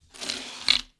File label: coins pickup